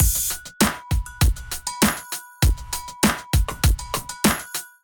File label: Kit groove 99 bpm, Drum; MIDI Clips; Klyde; Ableton; Drum Essentials